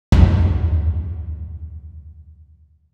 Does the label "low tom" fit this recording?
Yes